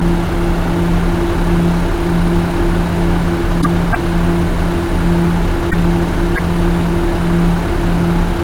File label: BUS; pictcode